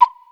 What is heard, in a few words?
DR-660 FX; Rubber Chicken Software Sample Bank